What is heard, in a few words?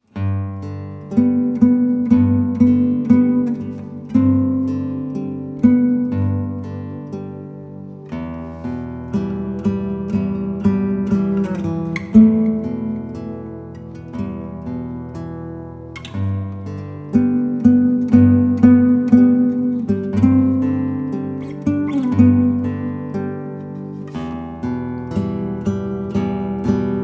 Peaceful Monday Morning; Guitar Arameshbakhsh